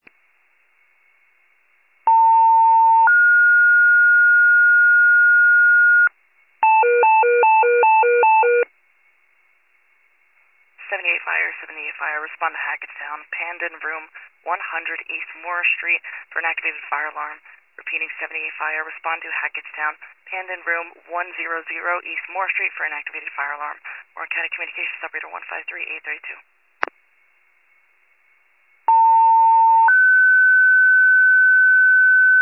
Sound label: fire, pager alert